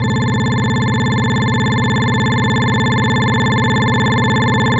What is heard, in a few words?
distortion